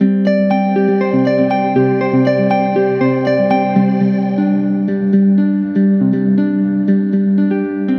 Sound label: Musical Loop 120 C Major; Foresee; Ghosthack